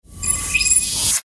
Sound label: click remove tag; jinteki